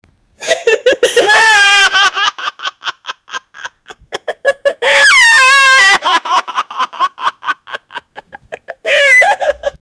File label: teenage boy laughing